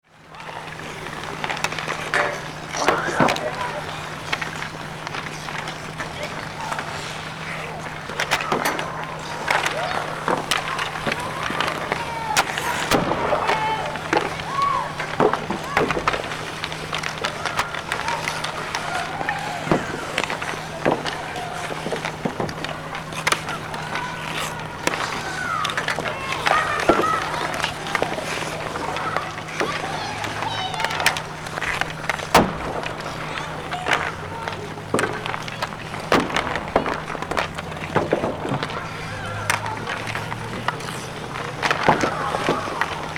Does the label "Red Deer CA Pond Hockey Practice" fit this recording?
Yes